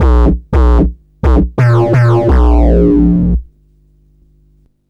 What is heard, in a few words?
Fuzz Bass, Drum & Bass; Fluid Dynamics